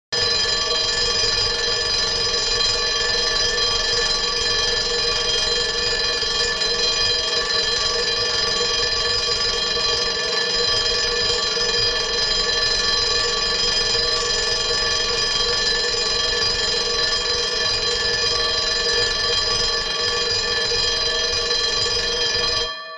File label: Bell Burglar Alarm